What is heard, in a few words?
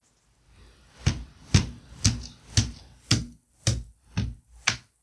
walk